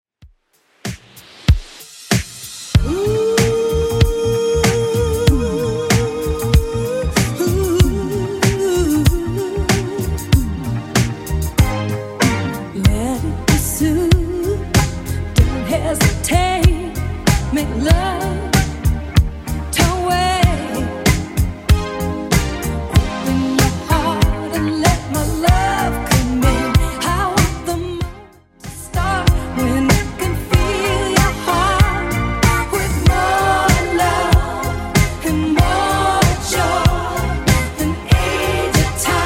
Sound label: 80s Redrum 95bpm